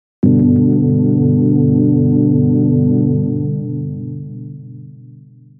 Forest Organ, jup8

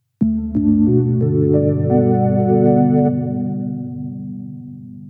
Sines of Life, jup8

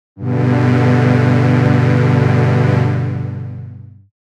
Lo Strings, jup8

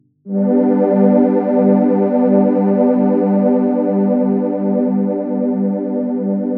Angels Pad, jup8; Aphelion

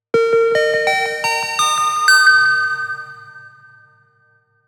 Synthwave; jup8; ANABELL

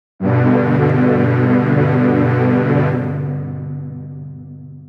Techno Animated Pad; jup8

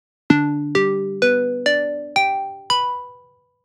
Celesta; j106

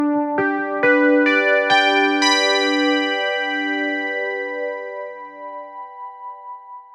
Beauty; jup8; Aphelion

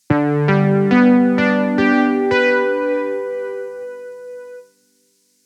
Poly Chorus; j106

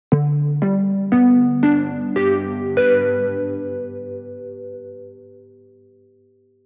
Dist Keys, jup8

